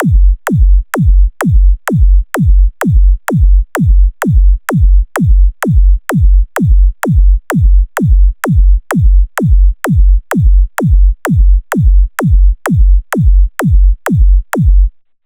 Beat 128 bpm

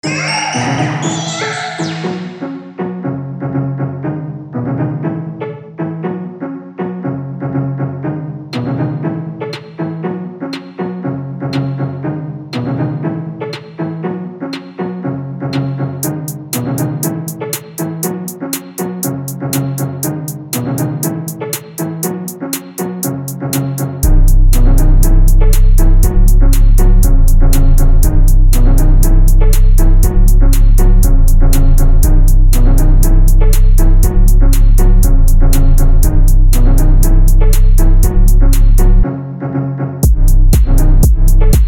Phonk Фонк

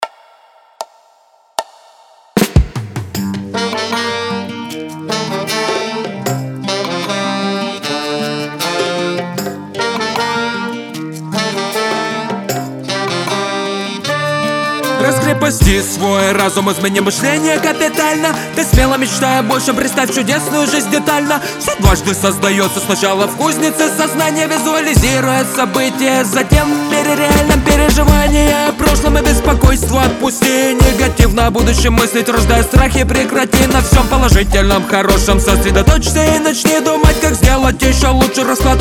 Reggae

